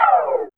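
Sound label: synth lead; E-Lab; X Static Goldmine 1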